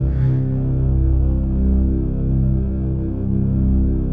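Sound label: PAD, atmospheric pads; Best Service; XX Large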